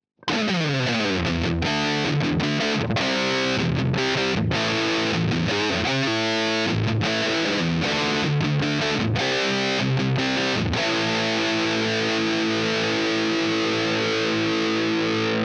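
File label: Hagstrom distortion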